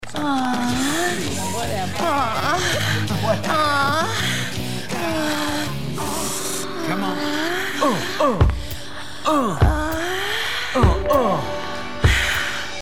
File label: rodeo moans